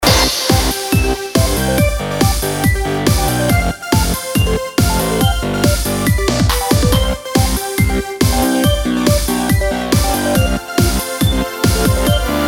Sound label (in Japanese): 素サイン波無加工伴奏